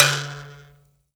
MBIRA D 2; BS MBIRA1; Heart of Africa

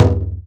BASS, INDIAN PERC

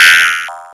ARP2600; AMG - Vince Clarke Lucky Bastard

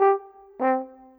Horn, Orchestral; Sonic Foundry (Sony Creative Software); Orchestral VOL-2

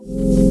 Fm Dub Chord; Electronisounds; Enigma Dub Cube One Shots

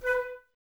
Flute, Woodwind

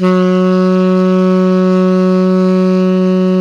TENOR PP F#3, Saxophones; Greytsounds; Philip Wolfe David Crozier's Saxophones

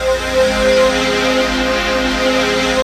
C, Pads; Zero-G; Total Drum Bass

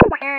ELECT FX; USB Soundscan vol.27; Ragga & Dub Reggae; AKAI

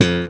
pop bass; E-MU Formula 4000 Series Vol. 3; Analog Odyssey; VK+ Instruments

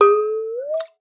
water; Xsample VOL-9

